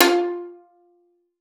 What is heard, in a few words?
F4, VIOLIN PIZZ; Xsample VOL-5